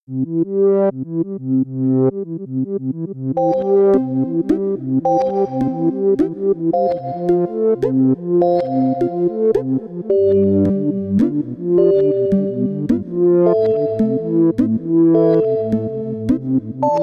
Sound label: Waltz